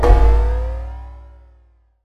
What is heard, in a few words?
score landing